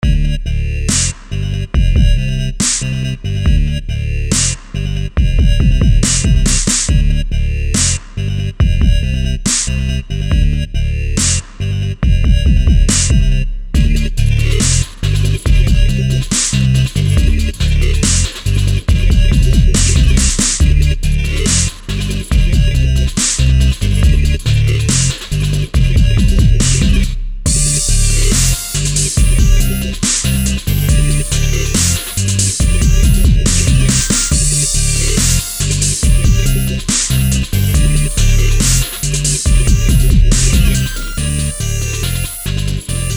Funky Dubstep

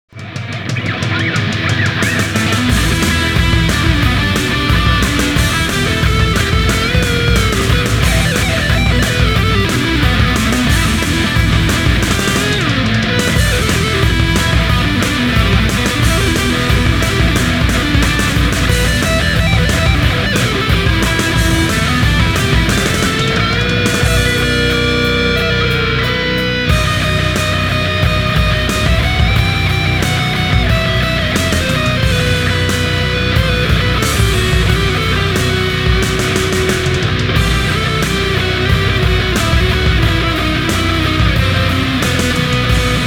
Hair Metal Intro

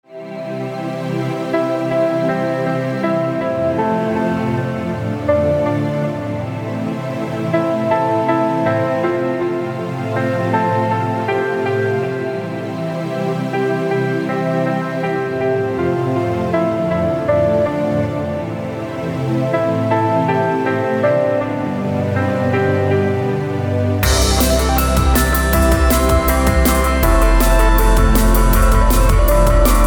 Waves Wash Over Me

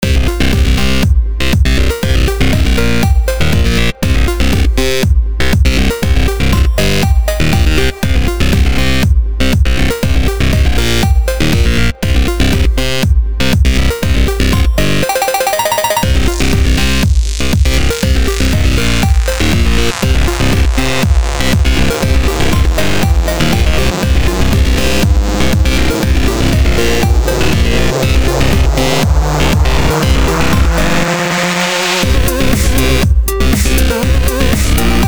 Eight Bit Robot Dance

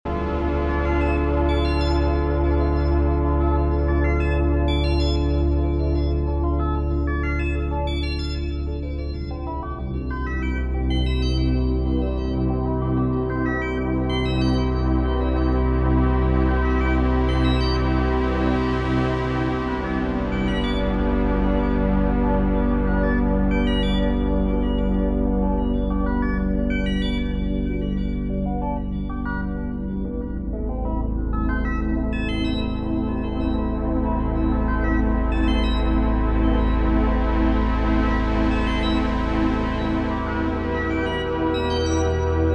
Single Synth Loop

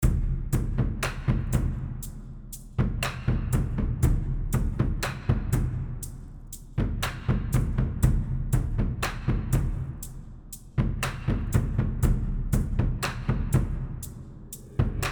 Evil Electronic Percussion

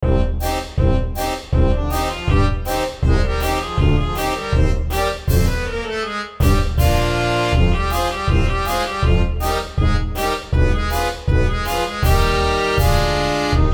Totally Not a Scary Accordian

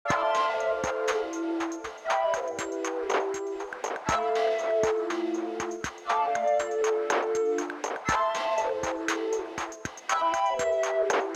Inebriated Flutists; Loop

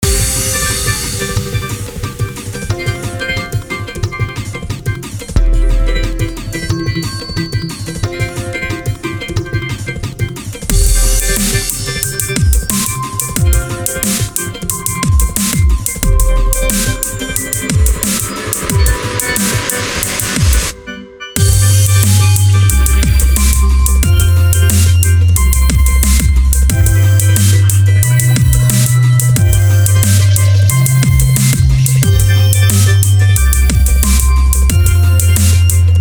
Transistors and Rain Droplets

